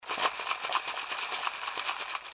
tool box

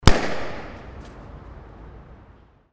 burst

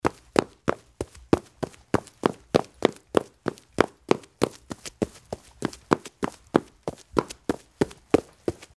footstep waraji

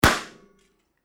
wheel burst

